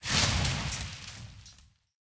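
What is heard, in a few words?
bullet hit building; apache; War Thunder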